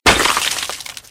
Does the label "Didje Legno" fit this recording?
No